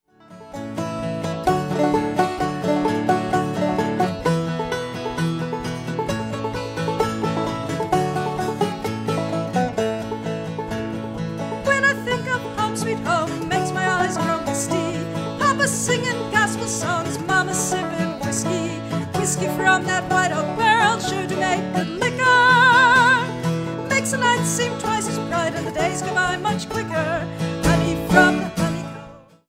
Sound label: vocals & clawhammer banjo; guitar; Wild Rose of the Mountain